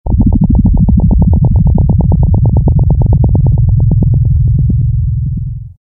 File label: pulses deep modulated